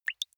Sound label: single drops falling